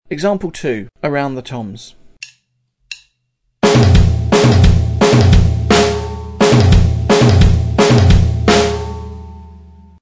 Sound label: drum set